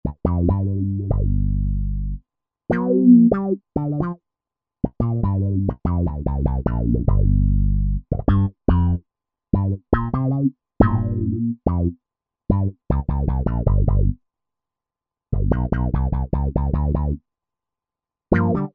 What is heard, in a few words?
Funkin' Wah Bass; Roland SR-JV80-10 Bass & Drums